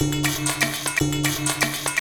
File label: Indian, Percussion Loops